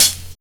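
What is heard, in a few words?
97 Drums, Underground Hip Hop; USB Soundscan vol.02; AKAI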